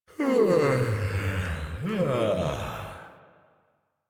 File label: Long Sigh; Warframe; Deimos; Father; Vocalizations; en